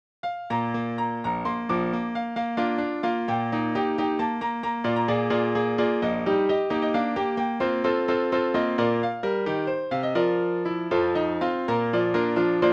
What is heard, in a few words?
piano concerto piano 4 Hands; mozart; capella audio2score